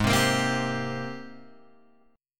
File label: G sharp Major 9th G sharp, Guitar Open G